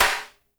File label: HAT SNR; Spectrasonics; Burning Grooves